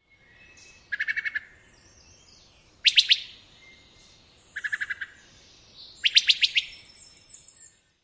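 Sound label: bup and pit volleys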